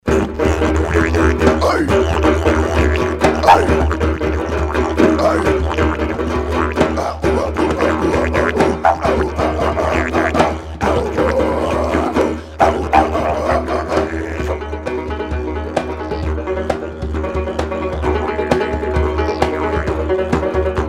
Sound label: flamenco